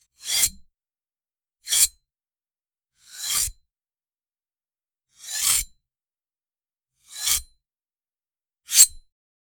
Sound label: the sound should be deepe, weaponry